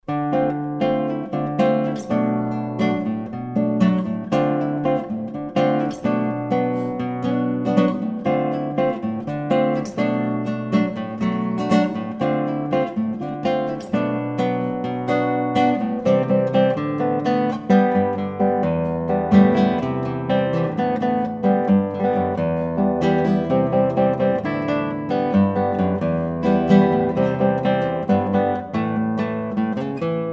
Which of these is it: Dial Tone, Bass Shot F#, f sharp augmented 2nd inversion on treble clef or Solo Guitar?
Solo Guitar